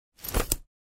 otkrivanie zonta

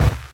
rezkij nepriyatnij korotkij zvuk pomeh televizora

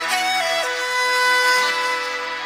tururu

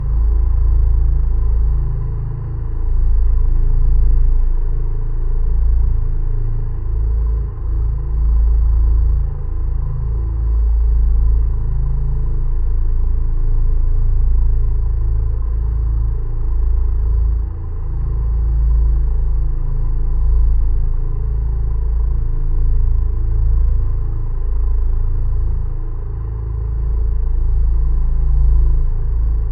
tihij zvuk raboti motora

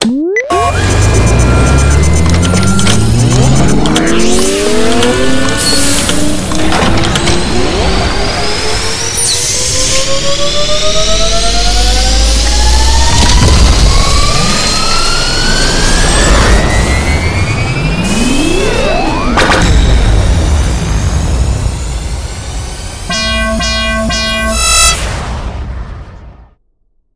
podgotovka blastera k strelbe na kosmicheskom korable

